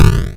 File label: shot hit; hyperspace-roll